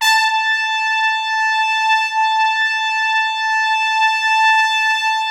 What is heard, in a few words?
Orchestral Brass